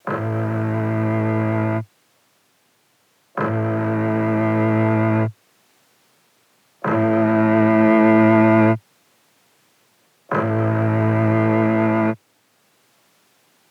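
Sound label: macabre cello note 70 A minor; candelabra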